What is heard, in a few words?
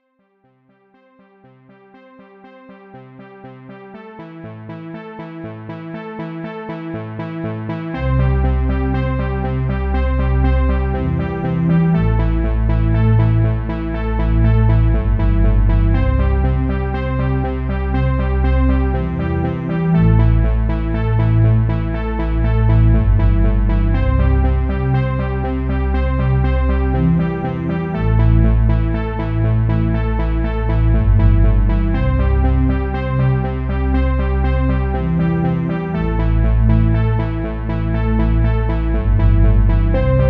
music aquatic; omniverse